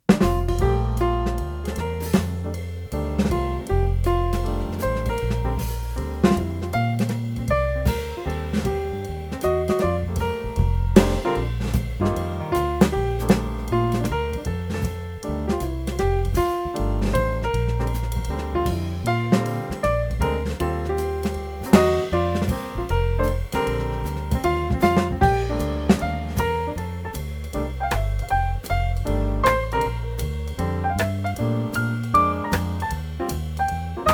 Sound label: Happy Birthday Bouncy